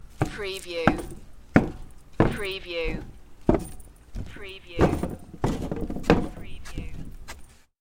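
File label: Footsteps Dock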